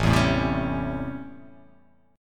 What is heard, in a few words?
A 7th Sharp 9th; A7#9; strummed; JGuitar